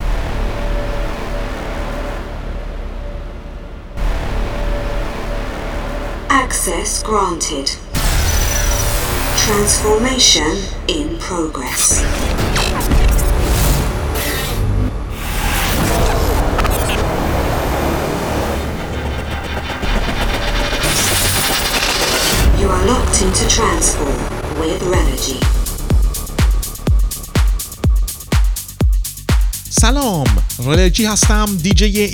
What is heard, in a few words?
Trance Form Remix